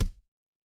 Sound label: impact thud